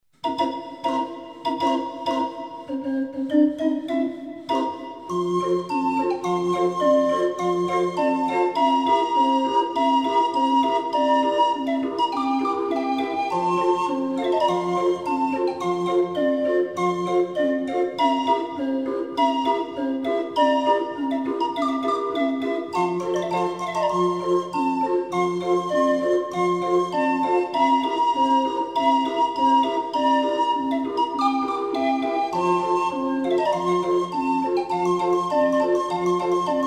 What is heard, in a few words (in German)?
20er Drehorgel